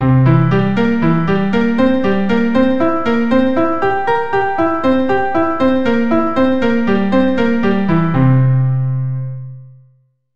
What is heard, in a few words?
C7 Broken chord, keyboard